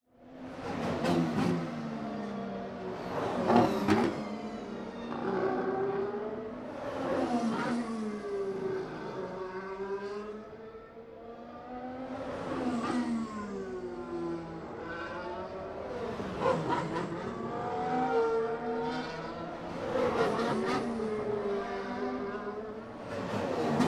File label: Race, F1, Formula 1, Grand Prix, Practice, By, Decelerate to Accelerate, Swells, Steady; Turn 8; Fast; Growl; Airborne Sound; Motorsports 1